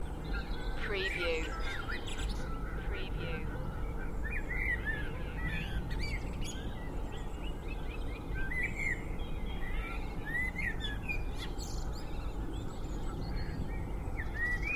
Three mins of Spring dawn chorus with distant traffic noise Cheshire UK; beanstalk audio